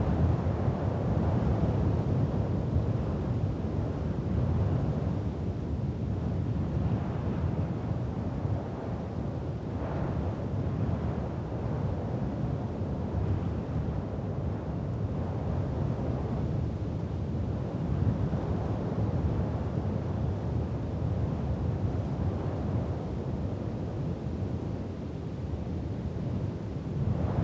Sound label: windy; assassin-bug